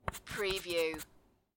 Billiard, Pool, Snooker - Apply chalk to tip of cue